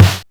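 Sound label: DRUMTRAKS snare, Drums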